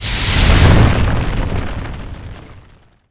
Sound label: fire